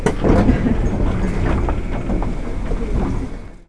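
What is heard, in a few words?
Door Open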